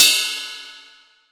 Cymbals

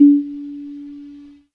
stutter fly, Synths

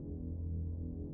dings, String; Notes

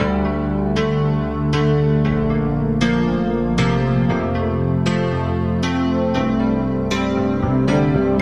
keys, Sad

